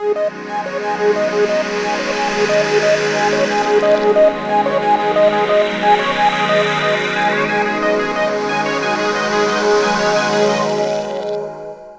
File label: Pad, Melodic Synth